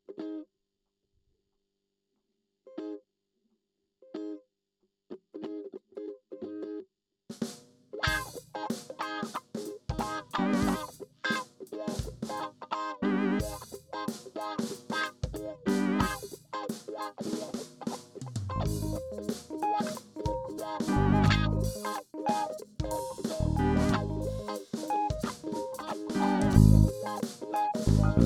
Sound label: grocery funk